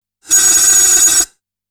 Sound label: Aliens Monsters; FXHome